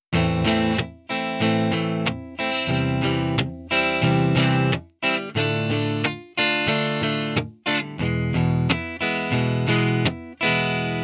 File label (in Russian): boya chetverka s glusheniem